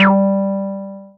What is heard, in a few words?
Synths BASS